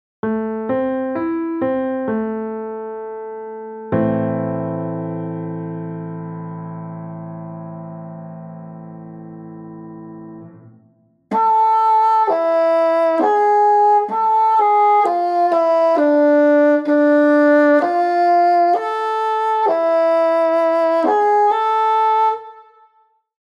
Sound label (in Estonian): Fagott; a-moll